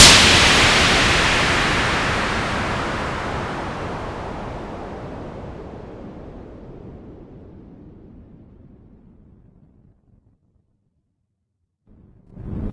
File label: Quasimidi 309, Drums